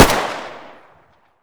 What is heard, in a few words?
uzi distance fire